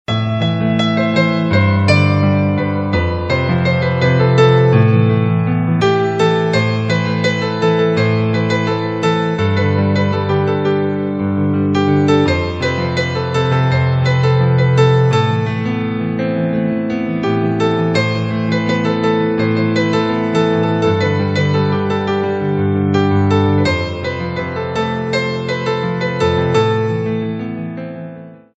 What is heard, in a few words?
Instrumental Tone